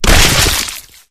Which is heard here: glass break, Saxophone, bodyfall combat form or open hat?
bodyfall combat form